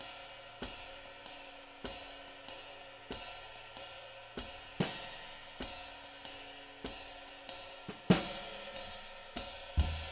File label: swing, drums; Sampleheads; Peter Erskine; Living Drums